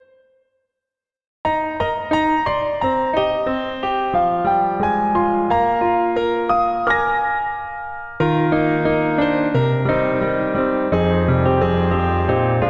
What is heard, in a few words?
Instr